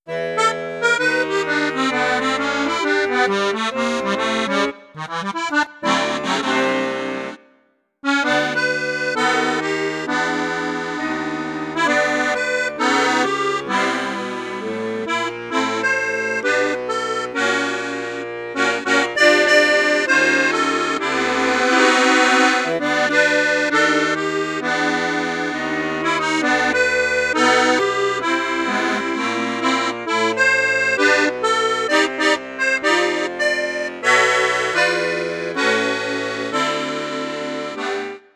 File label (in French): registre flute juste flute haute, accordeon; crucianelli